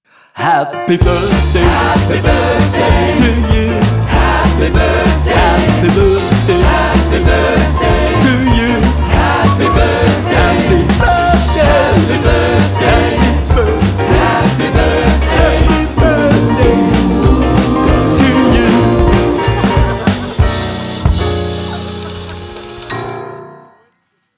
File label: HAPPY BIRTHDAY Jingle Version